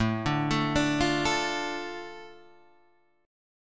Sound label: A Suspended 4th Sharp 5th, Guitar Half Step Down